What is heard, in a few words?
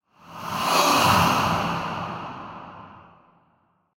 Ghost (strong attack); Phasmophobia